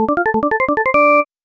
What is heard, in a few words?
basic win, melodies